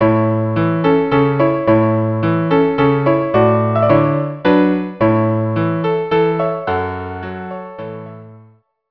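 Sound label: waltz string quartet; Brahms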